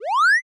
reverse

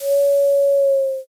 shakuhachi

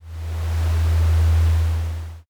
planet ambient

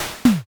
garbage

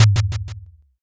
taiko

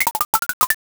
blocks collapse

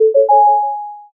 success

